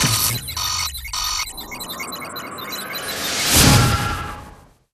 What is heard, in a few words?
mood matrix overload